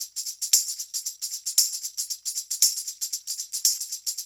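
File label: loop, tambourines; InVision Interactive; Percussion Slam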